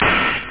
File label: hihat; da capo vol1; drums